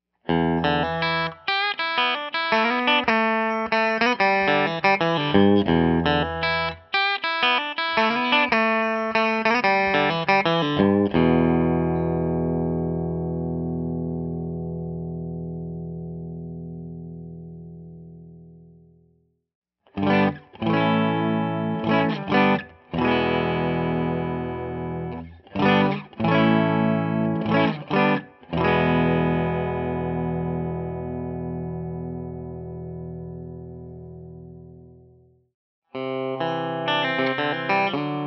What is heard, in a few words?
bridge clean